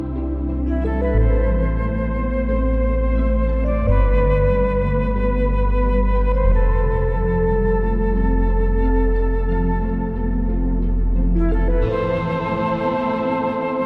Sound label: Lyre